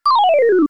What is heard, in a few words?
battle start; SNES; SMRPG